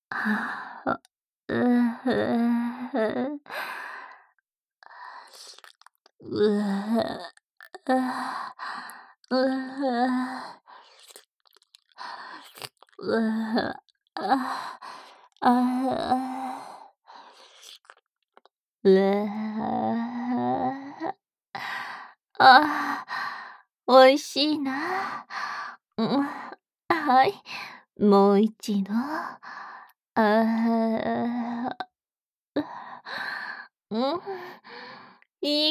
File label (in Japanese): キスのみ; 会長